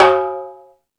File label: Latin Drums, E-MU Pro-Cussion